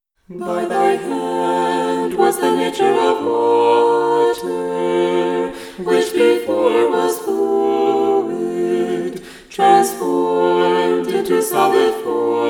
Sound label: Irmos; Choir